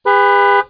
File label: HORN